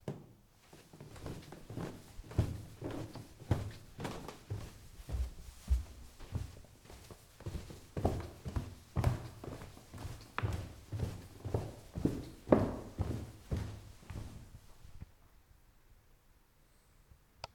Walking Down Staircase In Hall With Rustly Coat

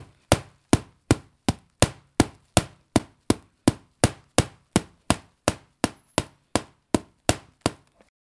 Punching Gloves

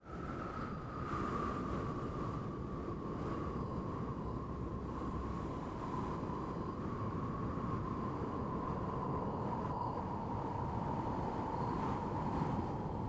Wind

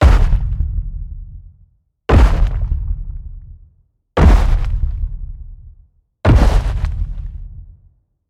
Monster Stomp Footsteps Sequence

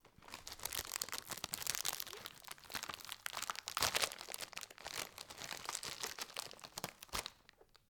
Plastic Crumbling

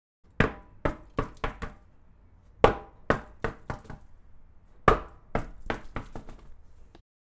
Tire Bouncing

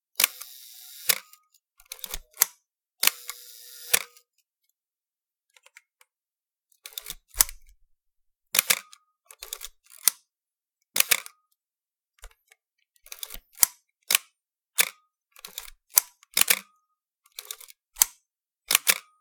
Filmcamera; Torusknot

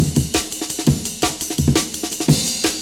Amen

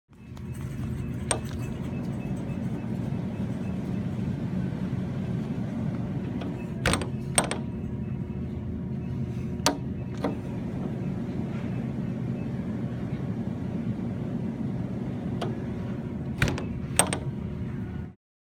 Grocery Store Freezer Door

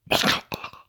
Gnasher Death

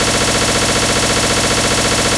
Machine Gun 8 Bit

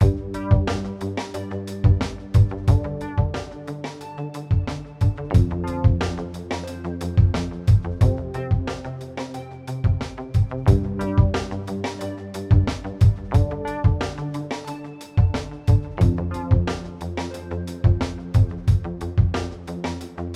Smooth Bassline

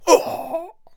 Fight Shout Male Hit

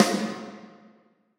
Snare

